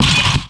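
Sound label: KRUNCH 120 BPM; MACHINES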